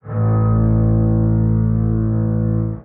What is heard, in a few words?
Strings